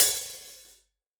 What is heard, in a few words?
Acousti Hat Hit, hihat; ultimate hihat; Hits